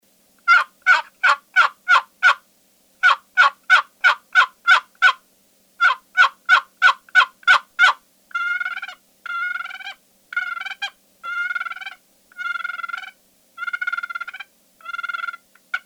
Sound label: Butternut call